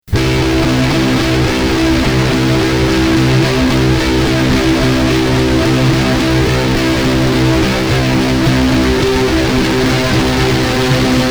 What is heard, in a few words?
drone